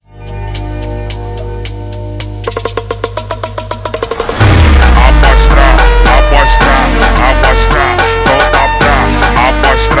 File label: NUVEM FUNK